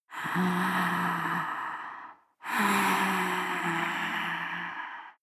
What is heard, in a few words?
breath female moaning breath angry soft